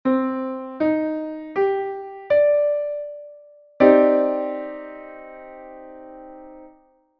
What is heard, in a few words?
Cmadd9